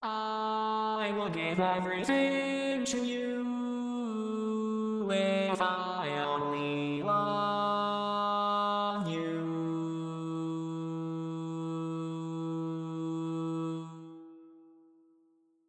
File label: Bari, barbershop tags